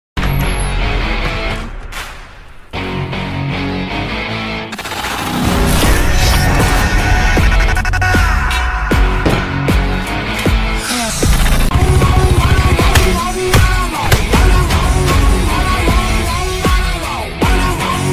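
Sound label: background music Ringtone; Kabir Singh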